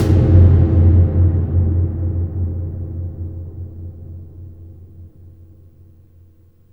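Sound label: CAVERN, AMBIENT DRUMS; Spectrasonic Distorted Reality 1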